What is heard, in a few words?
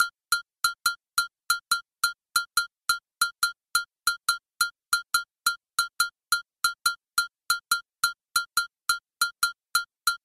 gaga tresillo